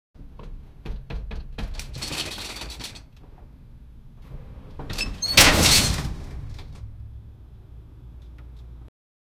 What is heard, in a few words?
window shattering